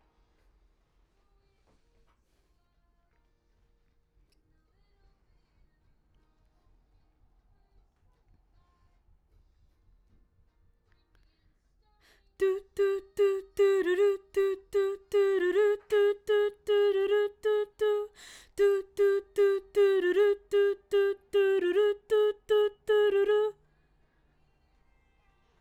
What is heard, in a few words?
BG Vox